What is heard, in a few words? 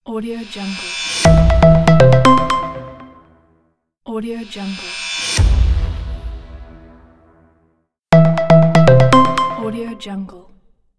reveal sound logo